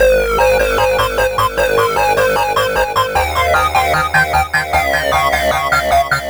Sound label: synth, Hardcore Breakbeat; Big Fish Audio; Hardcore Breakbeat Action; Robot Live